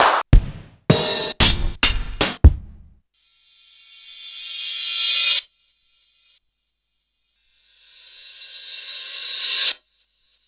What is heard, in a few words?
Roland XV5080 Rust Set